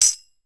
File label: ALUMINUM, TAMBOURINES; Voice Crystal - Steve Reid Definitive Percussion